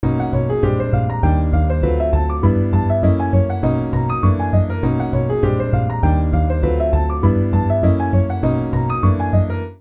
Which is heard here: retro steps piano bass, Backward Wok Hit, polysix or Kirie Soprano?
retro steps piano bass